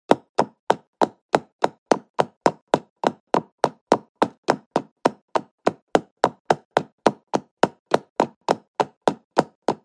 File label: Footsteps